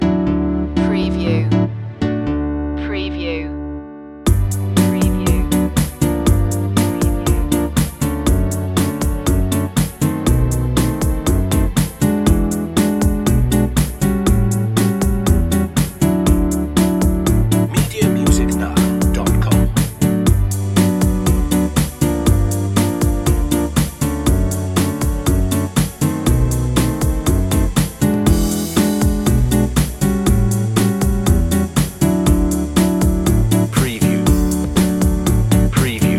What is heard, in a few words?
happy